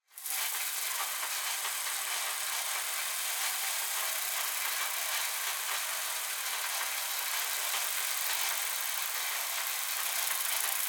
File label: Textures and Drones High Mecha Insects Swarm; Sergey Eybog; Artificial Life